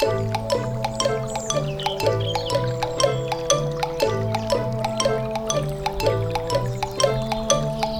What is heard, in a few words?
ambience; HauntedBloodlines